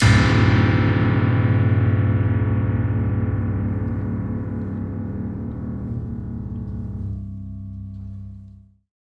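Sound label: Grand Piano Strings Deep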